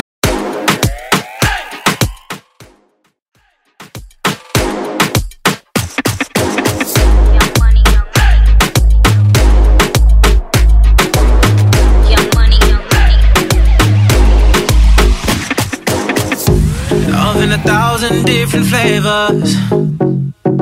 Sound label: Dancehall RMX Bootleg 101 Bpm